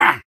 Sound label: soldier taunt exert; video games; source engine; tf; taunts